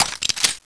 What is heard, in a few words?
clip in, weapons